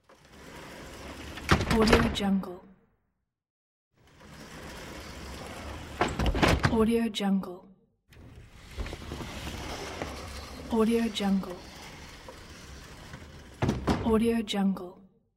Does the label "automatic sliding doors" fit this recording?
Yes